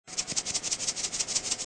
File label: maracas